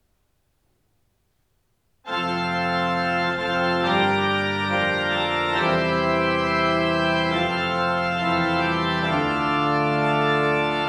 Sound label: Fanfare, Flekkefjord kirke